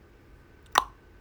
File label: swipe